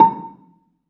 BELA A4, BELAFON, Asian Traditions; USB Soundscan vol.60; AKAI

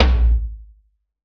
TR-707 Tom 6, Roland BK-5 Toms

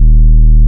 SUBSONIC D#1, BASSES; Best Service Alpha Dance vol.2

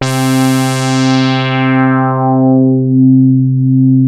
LEADSWEEP, BASS STATION; Syntec; Wall of Sounds

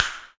FIRE CRACK, SR-16 DRUMS; EdgeSounds

